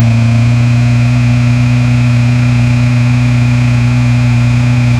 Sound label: cinematic second audio, bmw